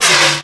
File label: damage; ungoliant; classicro